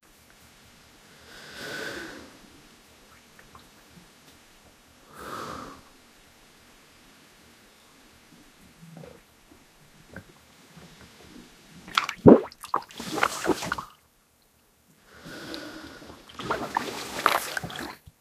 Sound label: young man vomit water